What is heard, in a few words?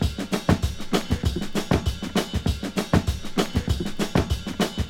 98 bpm drum groove c key, Free drum loop - kick tuned to the C note. Loudest frequency: 1186Hz